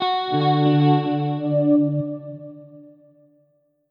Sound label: youtube jingle 3ds wii apps